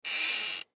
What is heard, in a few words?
Metal Handle Turn, Metal Handle Turn Knob Slow Squeak; Foley; Metal Doors; Blastwave